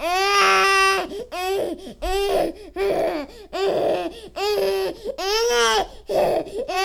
CRY, Baby; 1000fx

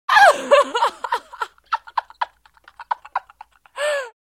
Laugh Female Close Perspective; Human Sounds

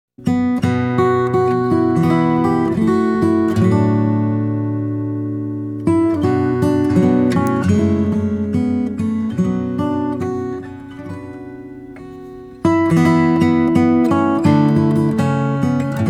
guitar solo

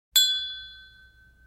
Glass Ding Crystal Champagne Flute Toast; Household

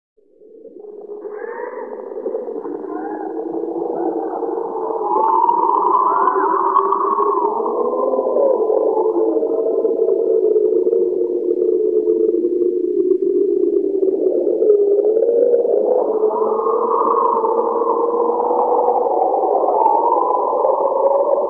Medium Wind Whistling Gusts

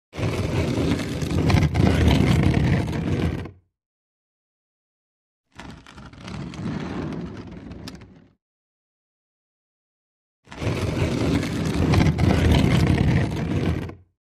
Stone Grind On Wood; Hollywood Edge Foley Sound Library

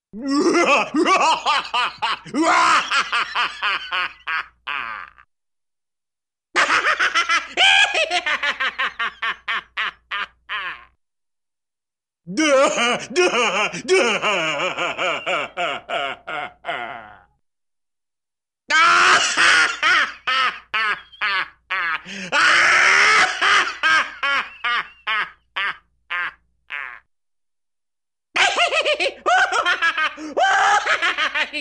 Evil crazy stupid Laugh, Cartoon; Hollywood Edge Cartoon Trax

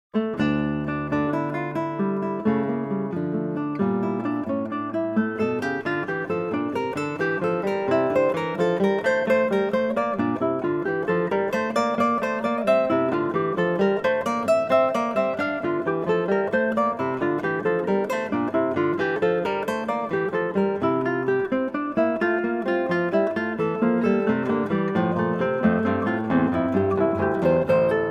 Gigue, on 8 string guitar; English Suite No.2 BWV 807; JS Bach

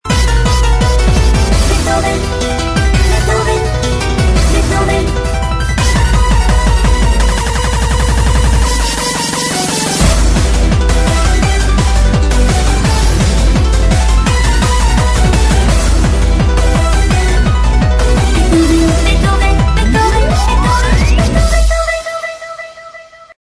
Funny Remix Ringtone

